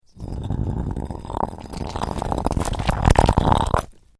Stone Drag With Some Debris, A large rock slides down a rocky surfac; Gathers momentum and impacts with some dry leaves.; Rock Sound Effects; Lynne Music